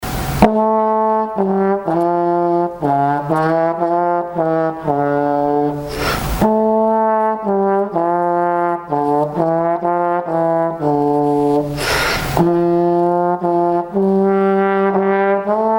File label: Trombone